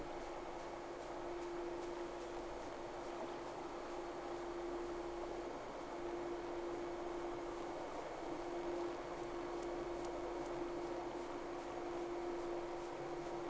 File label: Whales; SeaSnake